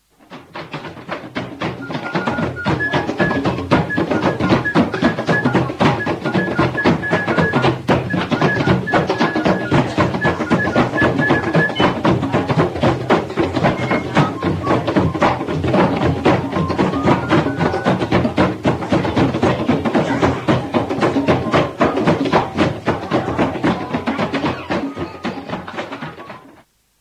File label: drums